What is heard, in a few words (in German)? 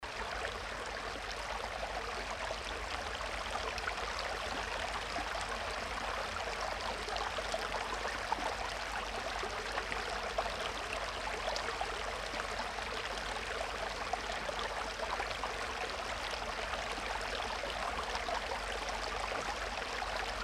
Bachplätschern